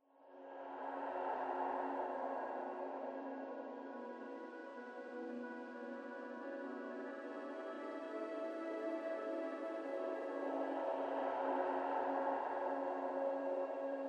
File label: Universal Breath Music for Hypnosis; this song is at least 30 minutes in length to insure a smooth continuous flow of simple but